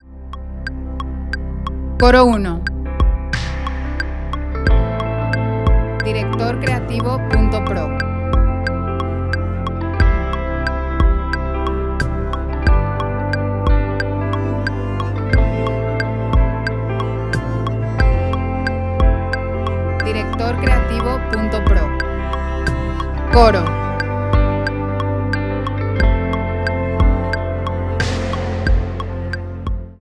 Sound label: Lead C 90 4 4